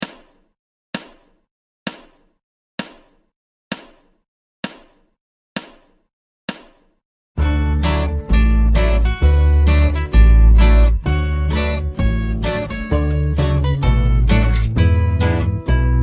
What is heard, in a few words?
65bpm; Parcours Acoustique; guitare